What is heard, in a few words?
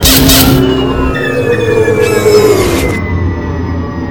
speeder bike decel; swg mods